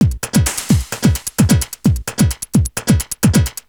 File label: Beat, Industrial